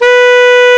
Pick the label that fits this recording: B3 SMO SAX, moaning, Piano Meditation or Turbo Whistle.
B3 SMO SAX